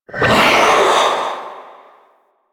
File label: Wraith Reaper Vocal Jump; Warframe